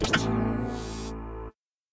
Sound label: Power Switch; Treasure of Nadia